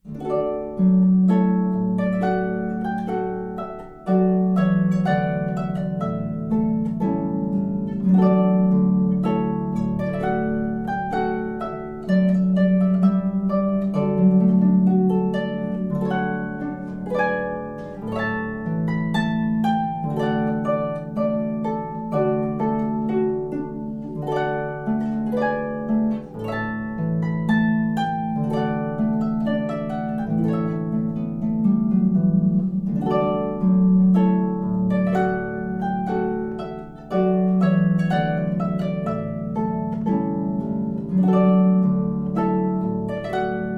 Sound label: Trumpet Voluntary